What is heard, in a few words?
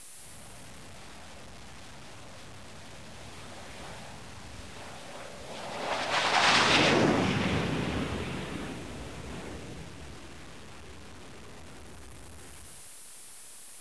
airplane; cstrike; aim training outside